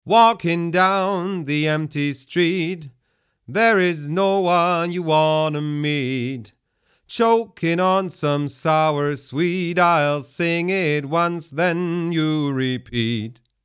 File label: Male Vocal; Tegeler Audio Vocal Leveler 500